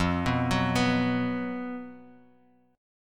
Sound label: B 5th F, chord; B5/F Chord {1 2 4 4 X X} arpeggiated